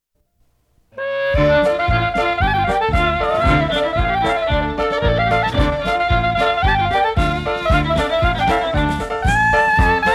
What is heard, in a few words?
Klezmer clarinet and band; Russian Sherele